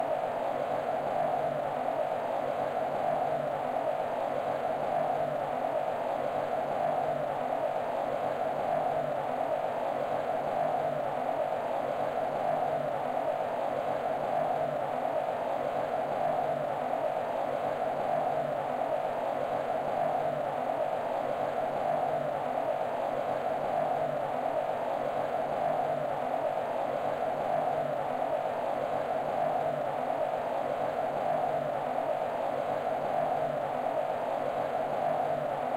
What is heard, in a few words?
Ambience - Blizzard